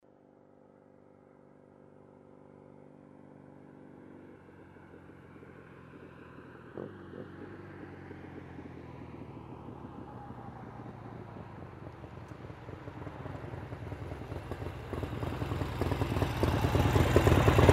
Harley-Davidson motorcycle approaching, waiting, turning off